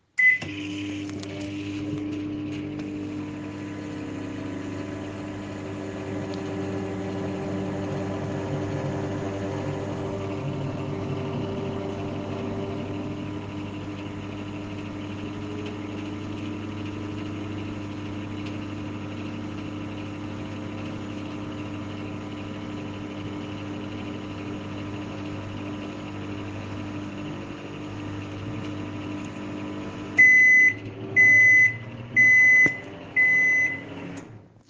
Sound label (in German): Microwelle